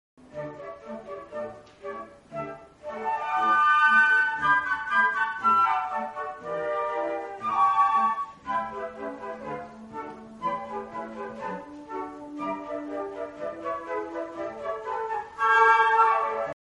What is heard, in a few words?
concert, flute